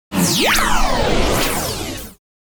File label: sweeper